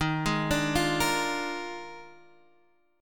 Eb Major 7th Suspended 2nd Suspended 4th Eb Guitar Half Step Down; EbM7sus2sus4